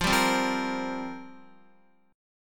F Suspended 2nd Suspended 4th F down Guitar; strummed; JGuitar

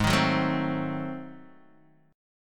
Ab 7th Flat 9th down Guitar Open D

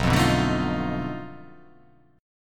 B 7th Sharp 9th Flat 5th, down

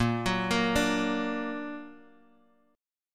Bb Augmented Bb Guitar Half Step Down, chord

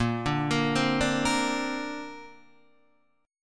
A sharp Major Add 9th, Guitar DADGAD

